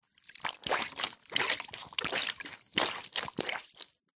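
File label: household cleaning cloth squeeze in shallow water rinse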